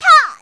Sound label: voice smash; lucy